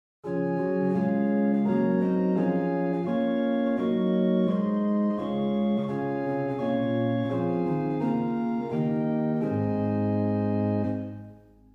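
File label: Gedackt 8 + Rohrflöte 4, organ; Reinhardtsgrimma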